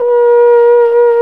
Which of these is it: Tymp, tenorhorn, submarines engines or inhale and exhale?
tenorhorn